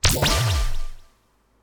upgrade; shweets sim

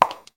step; shweets sim

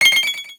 shiny; shweets sim; snivy

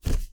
grab; shweets sim

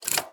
close; shweets sim

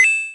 level Up Ready; shweets sim

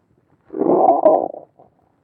gurgle; shweets sim; snivy

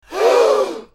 high score loss; shweets sim

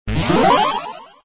level Up; shweets sim; snivy

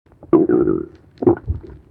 gurgle; shweets sim; snivy

